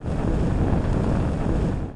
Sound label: Lantern Powering Up